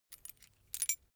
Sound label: key ring mid size put down; objekte; schluessel